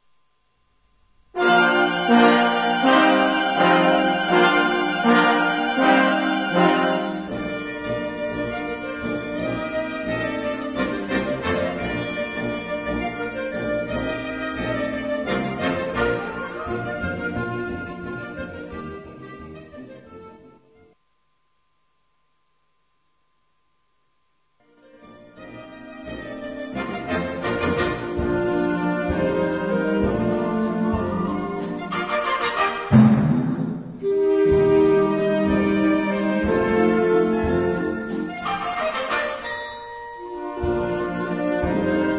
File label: Jingle bells